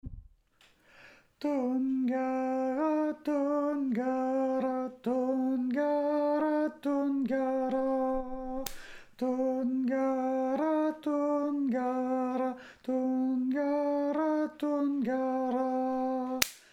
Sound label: tenor; Tunggare D E